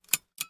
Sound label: Lamp Switch Pulling; switches